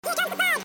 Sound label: foggy